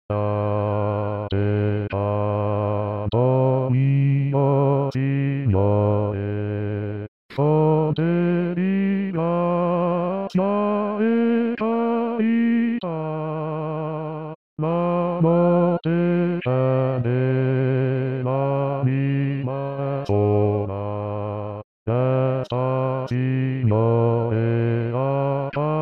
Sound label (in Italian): A TE CANTO, MIO SIGNORE Bassi